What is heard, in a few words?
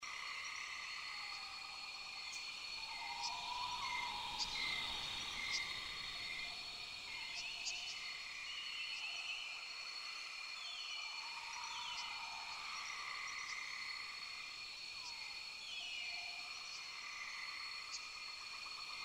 bg ambience, tiki beats; eyecon